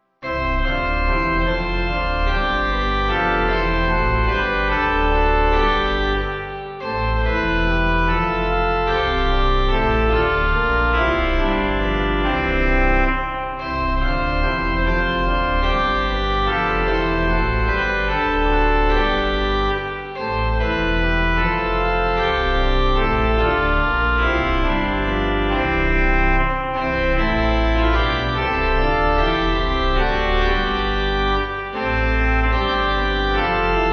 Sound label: Rhythmic Trumpet Pipe; Organ; 4/C Trumpet Lead